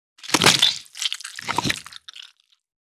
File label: Snake eating devouring prey